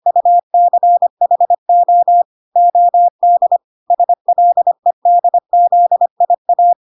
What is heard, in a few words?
25wpm